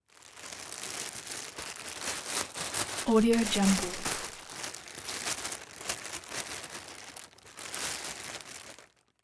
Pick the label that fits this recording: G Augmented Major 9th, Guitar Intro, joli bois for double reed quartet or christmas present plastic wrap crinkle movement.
christmas present plastic wrap crinkle movement